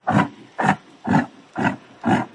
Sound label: yak